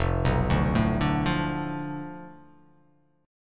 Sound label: F 6th Flat 5th F down, chord